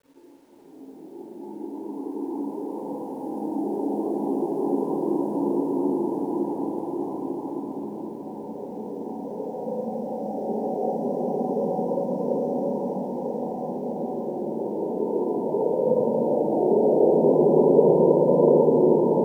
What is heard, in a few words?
Splashes Grains High Dens, Chalice Well